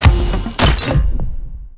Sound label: defeat shield